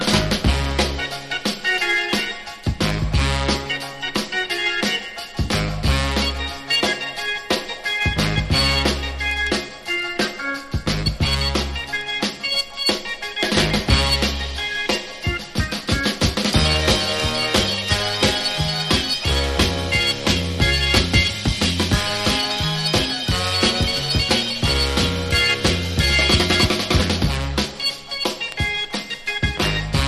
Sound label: 1962; oldies-rhythm-blues-doo-wop